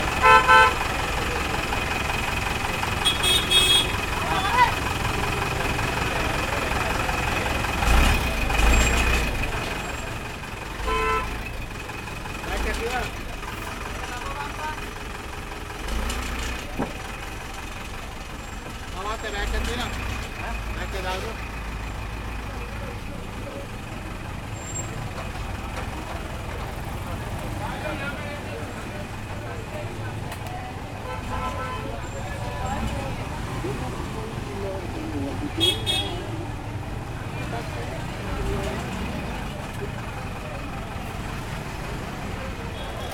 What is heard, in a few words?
Street Ambience Of Sri Lanka